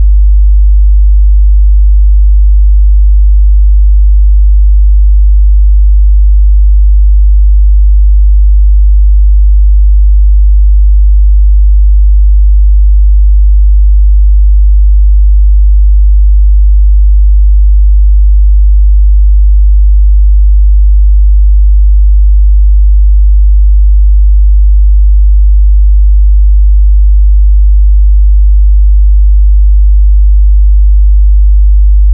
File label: sine 50Hz